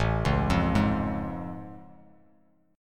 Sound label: A Augmented Major 7th A Bass Standard; arpeggiated; JGuitar